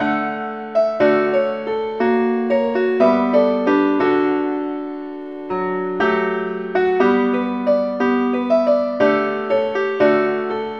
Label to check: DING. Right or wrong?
Wrong